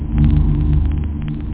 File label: choir, synth chords; da capo vol1